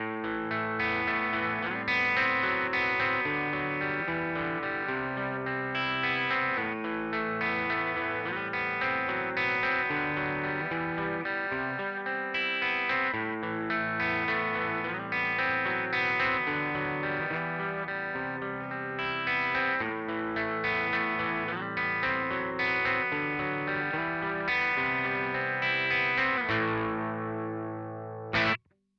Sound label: Vox Valvenergy Mystic Edge Cab